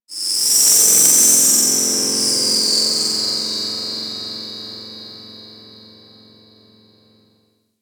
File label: Cephalon Tech Texture Small, Ambience